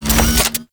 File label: Flak Cannon Reload; Warframe; Weapons; UT Weapons